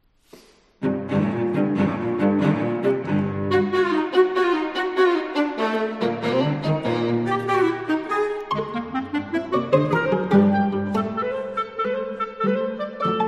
Quartettino na klarnet skrzypce altowke i wiolonczele Scherzino; Rezsoe Kokai

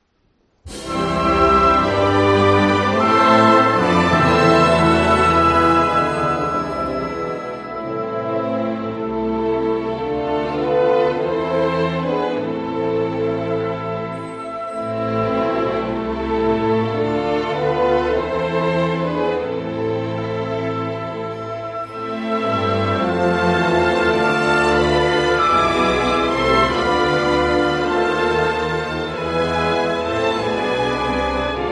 Hymn Bulgarii

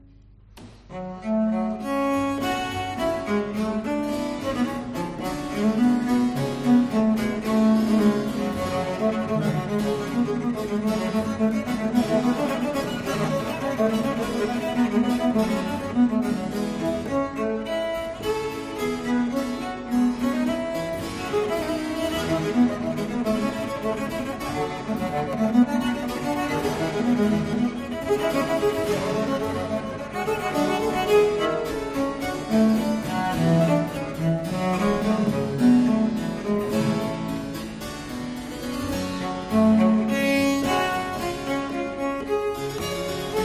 Sonata na viole da gamba g-moll, Allegro; Georg Friedrich Handel

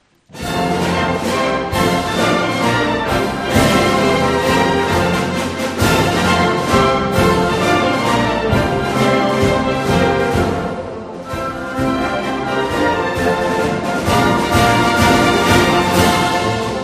Hymn Polski

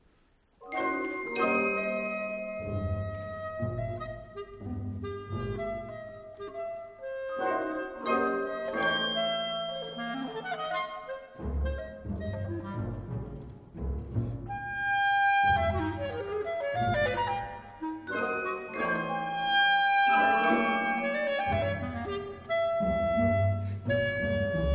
Koncert klarnetowy, Allegretto

